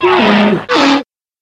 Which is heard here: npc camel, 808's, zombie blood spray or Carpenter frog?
npc camel